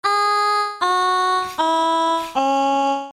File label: release noise